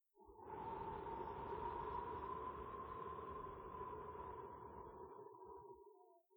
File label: Wind Soft Breeze